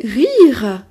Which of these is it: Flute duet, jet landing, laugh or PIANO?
laugh